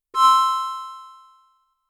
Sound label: light up